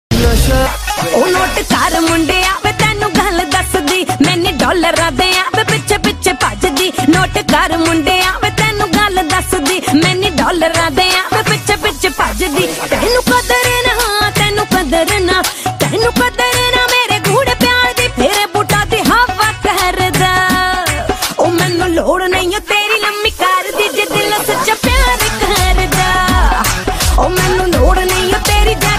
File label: punjabi song; bollywood ringtones